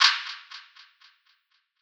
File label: perc spacey; Hip-Hop; Drum One Shots; SD CAICOS Hip-Hop Sample Pack; Slate